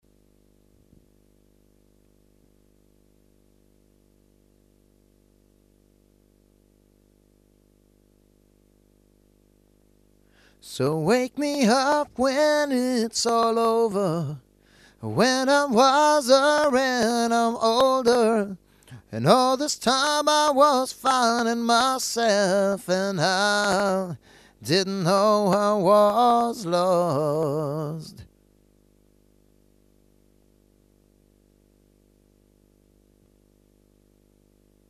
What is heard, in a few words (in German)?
mit great river grummeln; leichtes grundbrummen; neve clone